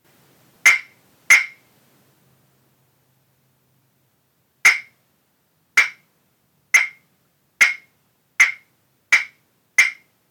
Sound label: Large Clappers